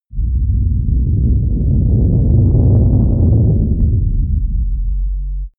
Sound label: spaceship flyby slow rumble maneuver; raumschiff